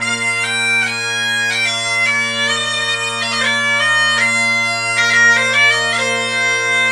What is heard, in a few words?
Highland Pipes; Sonic Foundry ACID Music